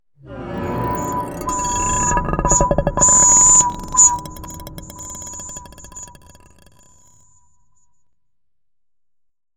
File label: Sdesign synth